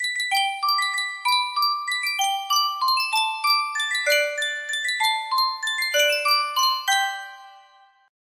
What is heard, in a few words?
Sankyo miniature music box